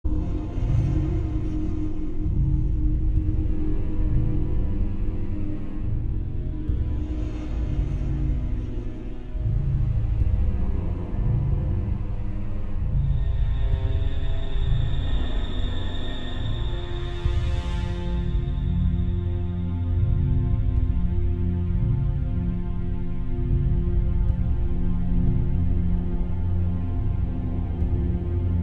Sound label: AMBIENT; F.E.A.R. Perseus Mandate